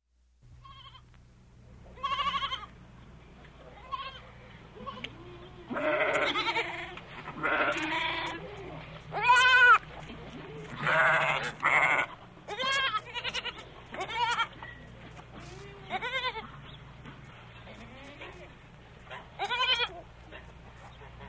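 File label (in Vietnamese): âm thanh của dê trẻ con và cừu đực trong chuồng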